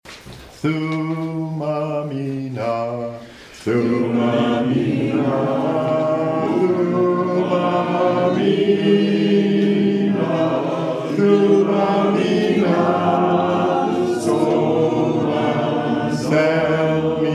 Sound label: Hymn; Thuma Mina